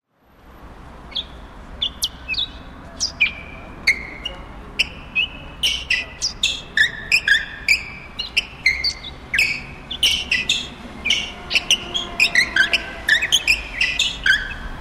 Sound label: sneakers song english